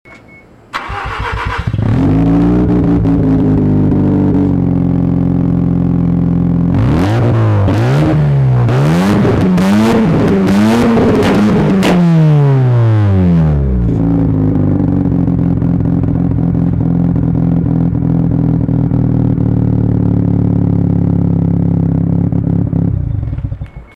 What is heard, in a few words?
fd3s sound